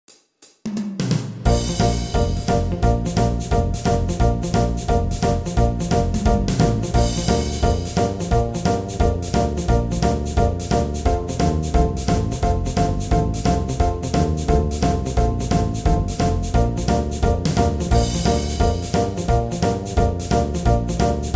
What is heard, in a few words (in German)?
Klarinetten